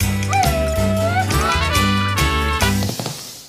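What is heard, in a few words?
music food inferno shooter intro; rayman origins 2011 gamerip; food world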